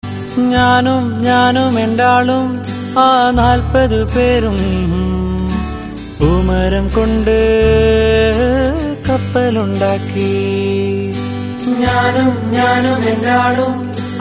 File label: malayalam